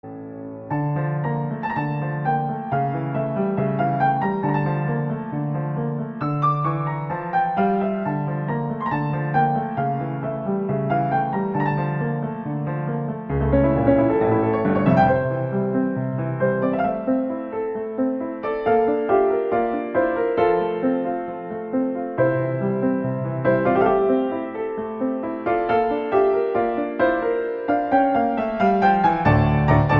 Piano Bgm Ringtone; Andhadhun

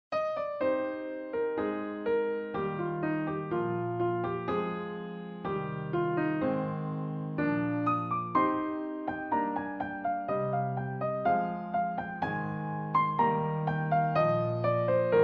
Piano Tone; Tamasha

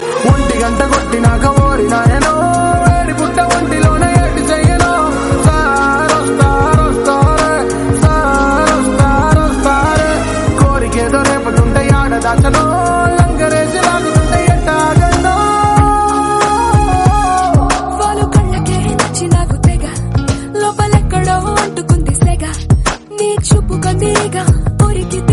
Male Ringtone; Businessman

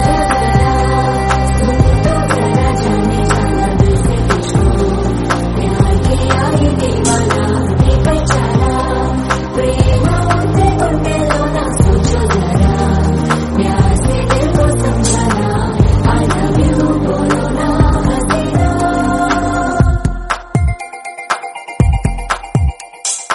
BGM Humming Ringtone; Dookudu

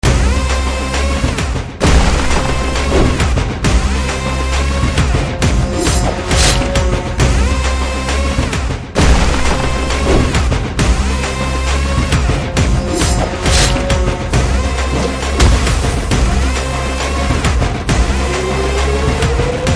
BGM Ringtone; Etharkkum Thunindhavan Trailer

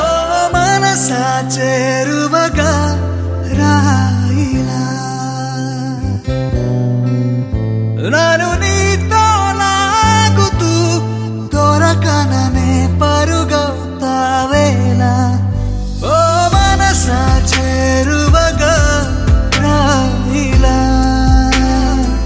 Male Ringtone

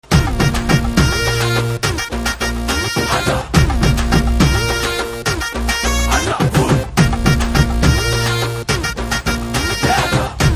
Bhoot Song BG Ringtone

